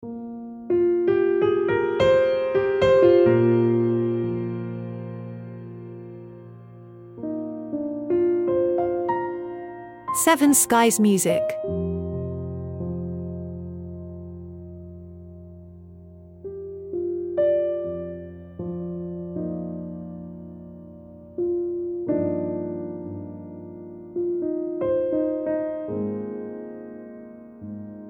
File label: Jazz Piano improvisation